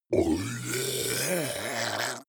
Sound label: zombie grunt choke male; kreaturen